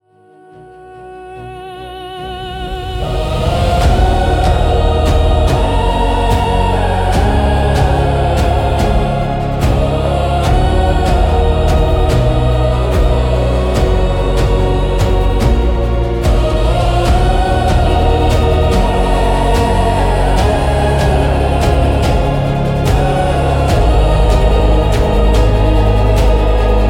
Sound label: Soundtracks, Cinematic Soundtrack; Tuneify; cinematic soundtrack pack